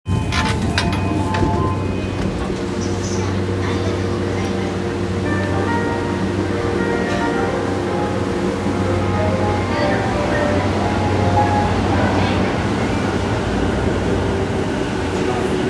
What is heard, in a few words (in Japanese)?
JR 山手線 日暮里 CCW, train sounds